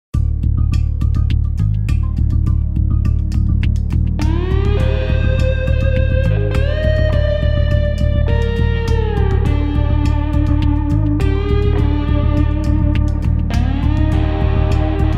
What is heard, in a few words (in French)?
impro locrien